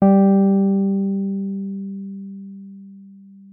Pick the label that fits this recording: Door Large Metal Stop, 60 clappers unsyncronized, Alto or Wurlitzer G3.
Wurlitzer G3